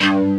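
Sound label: CUTTER X BASS; Pro-Rec - Synthsonic; TRINITY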